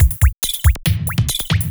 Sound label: Beat, Drums, Eclectic Electronica